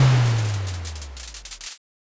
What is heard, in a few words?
firework burst